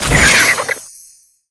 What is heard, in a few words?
familiar fairy equip; DunDef